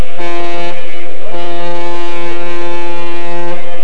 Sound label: diesel